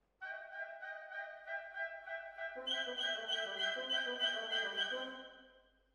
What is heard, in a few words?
Hall, Jazz for piano